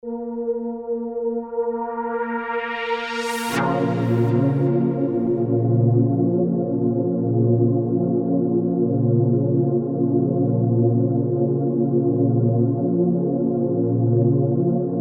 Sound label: Sweeper Tonal